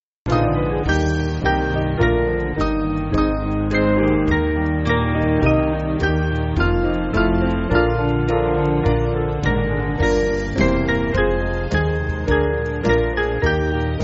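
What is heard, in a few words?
Band, Backing Files for Churches; There Is A Book; Southwell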